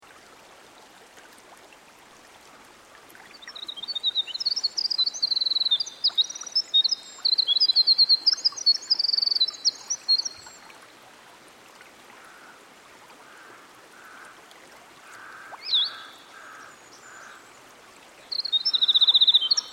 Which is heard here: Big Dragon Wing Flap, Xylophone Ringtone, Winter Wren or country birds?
Winter Wren